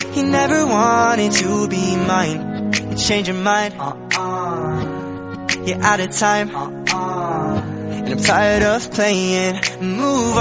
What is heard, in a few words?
english instrumental